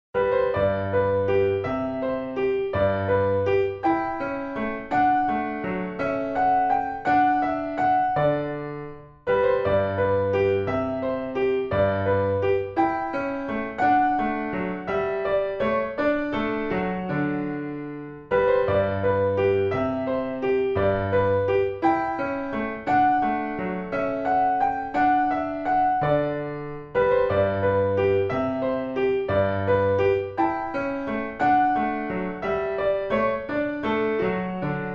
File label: Gigue In G; Telemann